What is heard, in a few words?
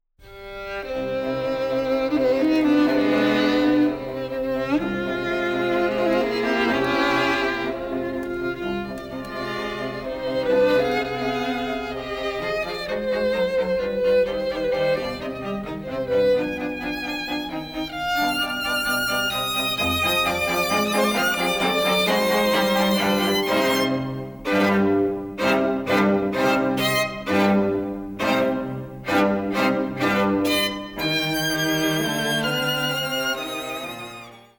strings; beethoven